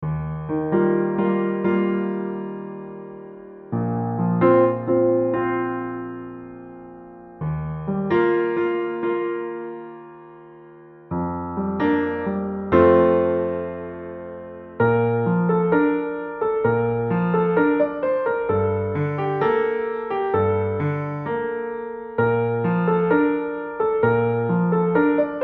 Heartbeat solo

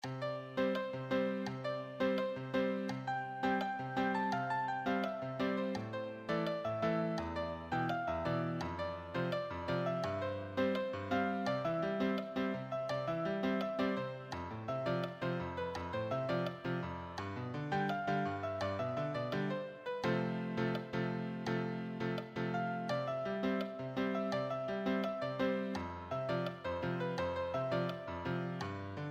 solo, piano solo

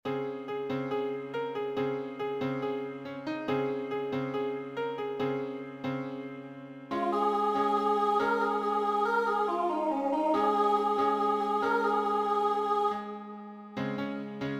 accompaniment, piano

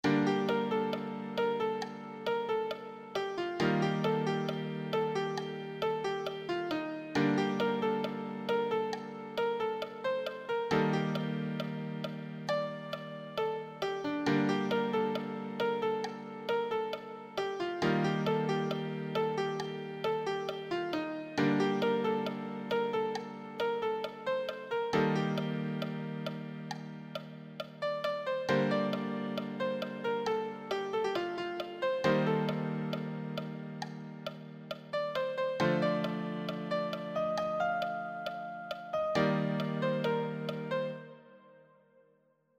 easy solo, piano

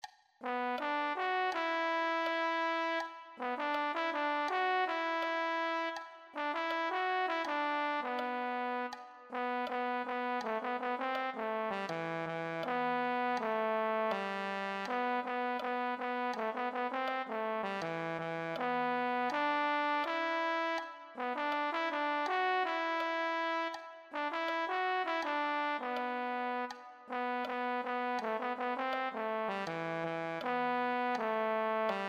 euphonium

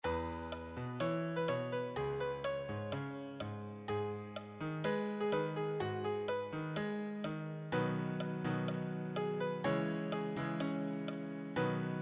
solo, piano

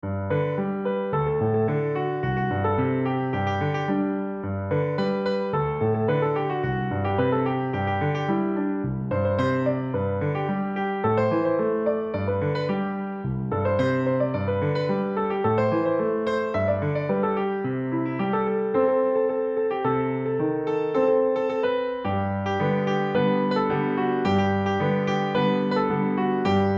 solo, piano

